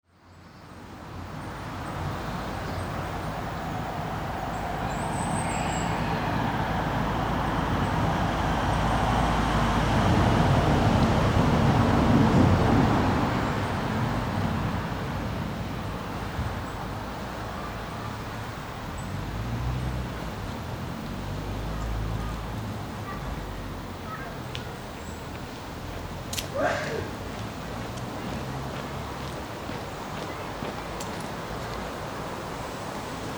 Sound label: Brynmill Park Soundscape